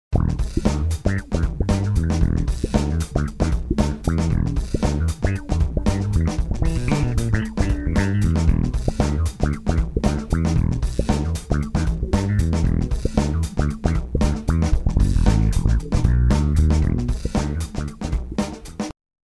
Filter

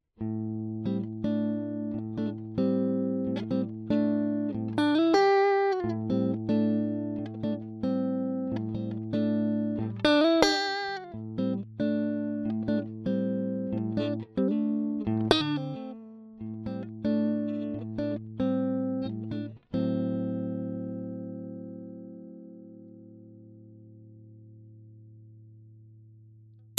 Vintage 4x10 trem

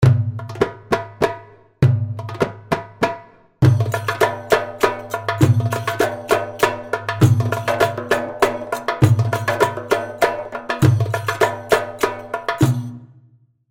Darig

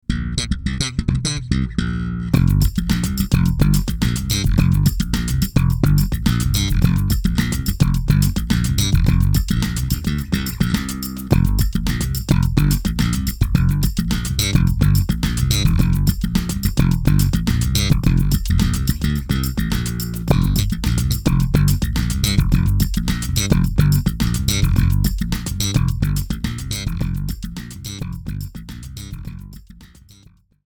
Trible Slap

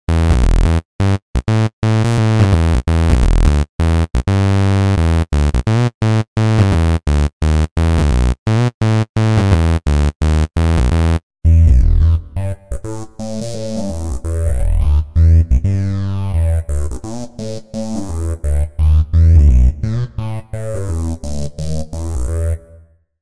Synth Bass

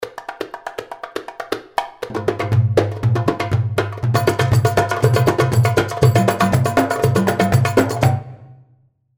mambo sudani 120, darbuka

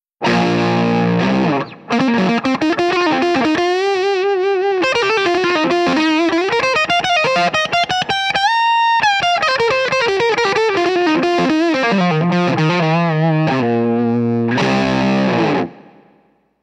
Lead Tone

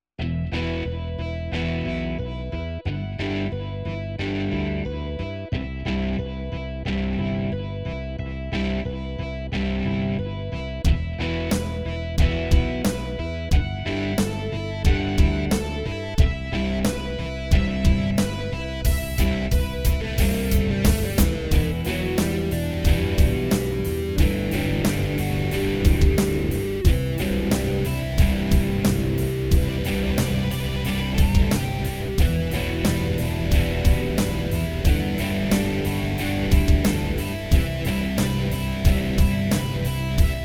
Rock of the 80s

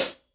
hi-hat closed

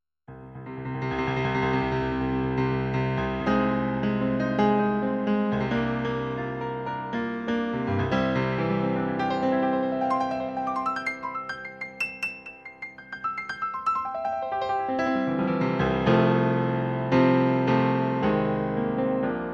Ac Grand

